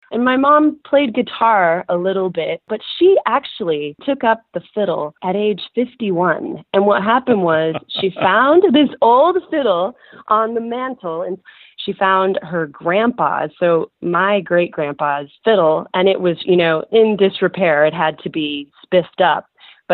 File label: fiddle